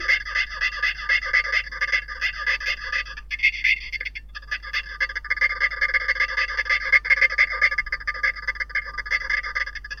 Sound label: Messor atanassovii; formicidae